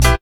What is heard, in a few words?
combi drums